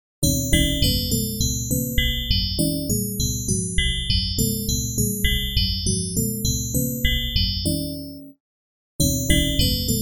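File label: string hi, Chromatic hits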